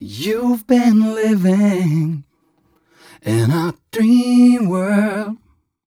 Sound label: m 129 mel e, Vocals